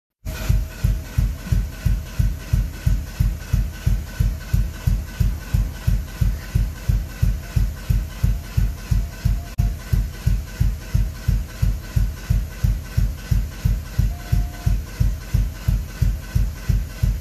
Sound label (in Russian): vyhl na holostyh